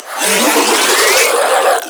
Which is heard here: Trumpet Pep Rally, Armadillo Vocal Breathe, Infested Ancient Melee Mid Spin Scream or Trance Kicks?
Infested Ancient Melee Mid Spin Scream